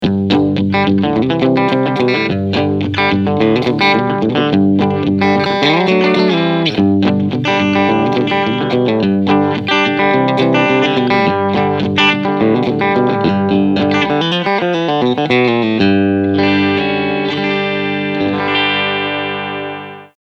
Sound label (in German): Steg PU Clean